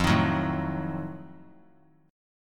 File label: F 7th Flat 9th F down; F7b9 strummed